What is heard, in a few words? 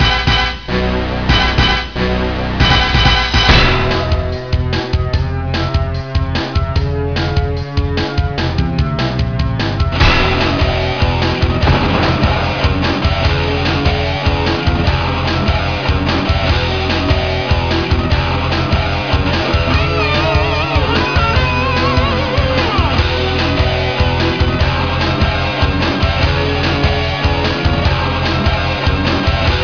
music theme; goldeneye